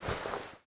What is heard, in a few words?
weapon draw